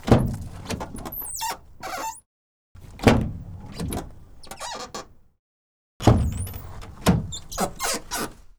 Renault Master F3500 dCi135 Foley Squeaky Door Open; Renault Master III; Mono